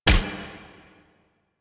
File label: Shotgun Low Clip; Weapons; DE; Warframe; Tenno; Pod Shotgun